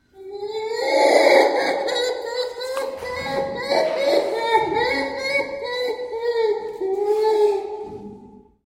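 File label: gorilla voice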